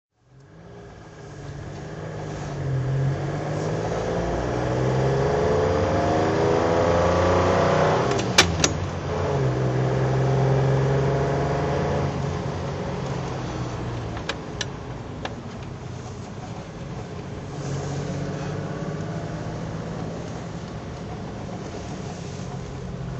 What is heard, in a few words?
citroen sm drive